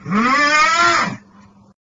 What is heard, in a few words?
augh